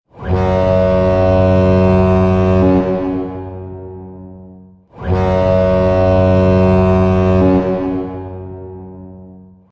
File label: Horn; VGK